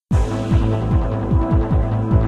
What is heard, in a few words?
Galaxy loop